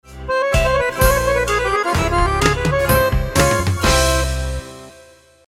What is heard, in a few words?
accordion; triton extreme